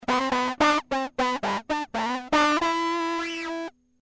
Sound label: FreqBox guitar